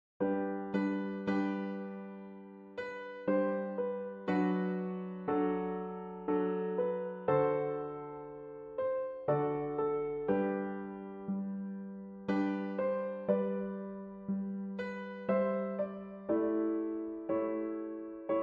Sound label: piano; cradled in a manger meanly